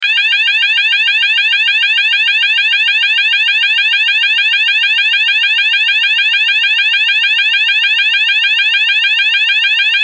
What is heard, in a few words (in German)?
Ton